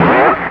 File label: SPLERGE